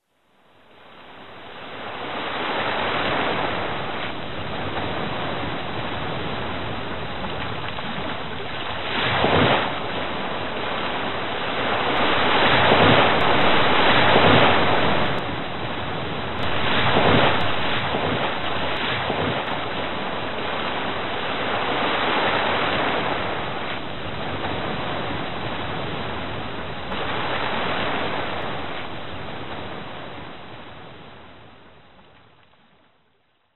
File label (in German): zu Text Sonnenaufgang, Geraeusche; Traumbilder